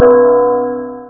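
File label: short bell; CyberDrone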